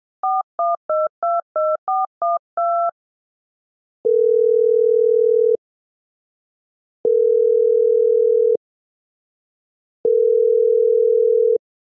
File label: dial up and ring tone